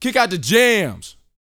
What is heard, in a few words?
VOCAL F; ILIO